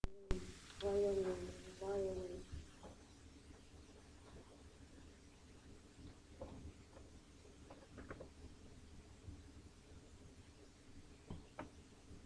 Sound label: violin